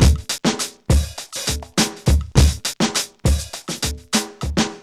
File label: FRESH BEAT 102, Funky Elements loops; Zero-G